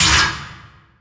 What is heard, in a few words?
Oneshot